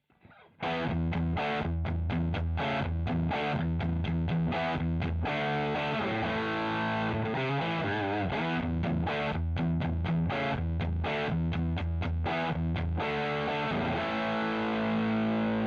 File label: EL34 BLEND; Boss Nextone